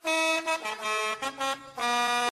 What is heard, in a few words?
horn